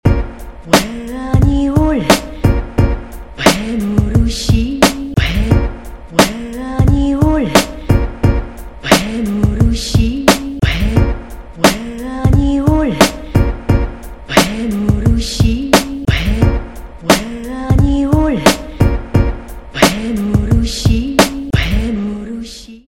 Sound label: uhuh